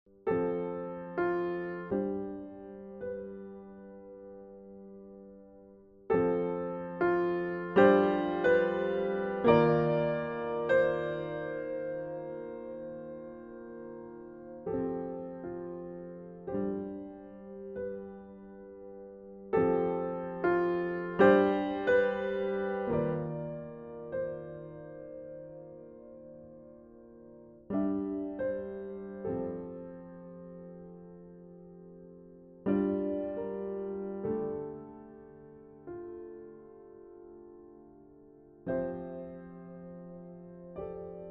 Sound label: Pensive Piano